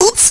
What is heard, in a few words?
combobreak; tekkito ietherial